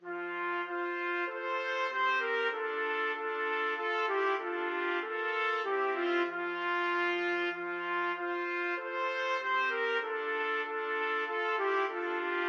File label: Christmas Hymn trumpet trio